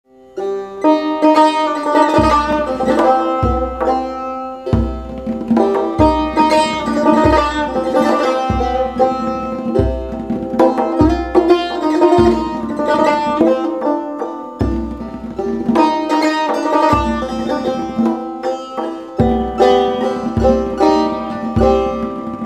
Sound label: Tar Tonbak